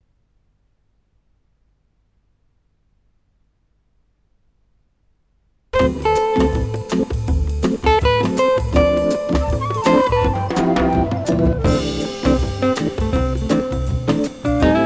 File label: preamp